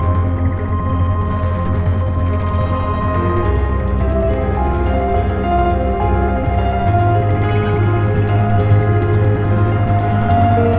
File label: Glitch sonata Slow